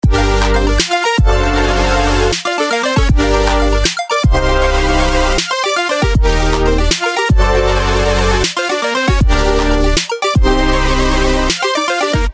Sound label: Future Bass